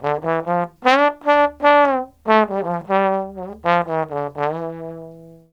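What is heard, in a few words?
TROMBONE SOLO, BRASS; BRASS KIT; Ragga & Dub Reggae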